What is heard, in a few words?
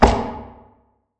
footstep; Barotraumatic; xanHuskmutanthunter